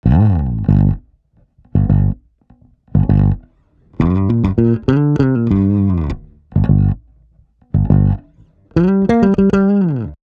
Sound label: tube test bass only Svet; reddi tube di